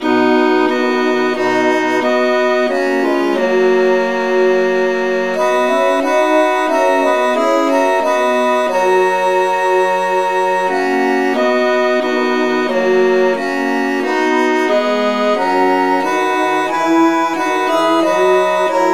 traditional key b; SAINTTHOMAS

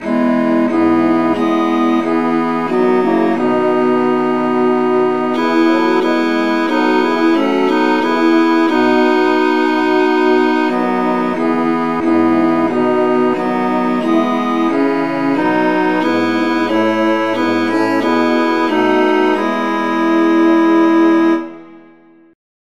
traditional, key E

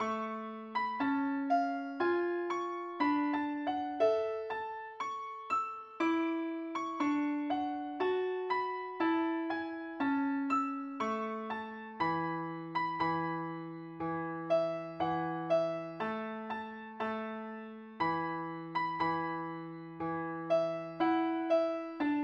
mandolin key a

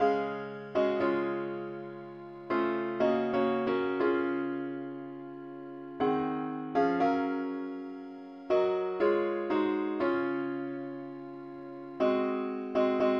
parts only key cis

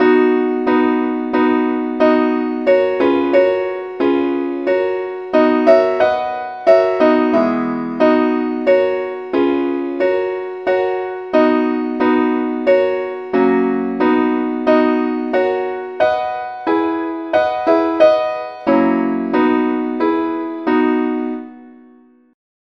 simple acc key b

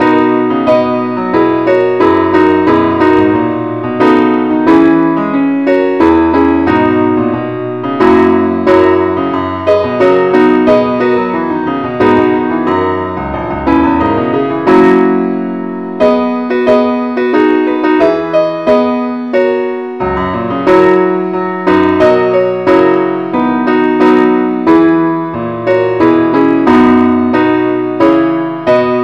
keyboard only, key b

